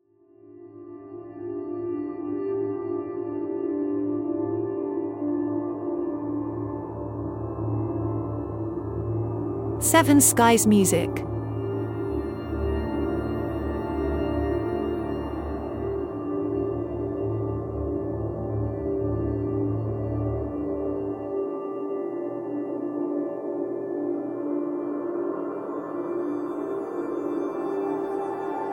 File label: Dark Ambience